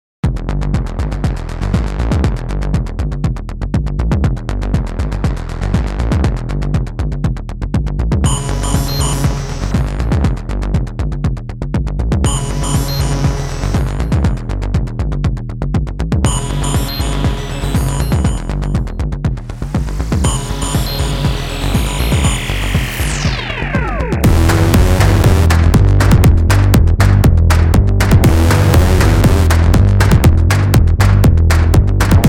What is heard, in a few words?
industrial electro-rock, mopho